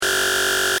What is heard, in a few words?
Sci fi machine loop, Scifi Machine: Loop, Short